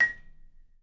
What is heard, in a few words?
A#5, Percussion & Harp; Miroslav Vitous - Percussion & Harp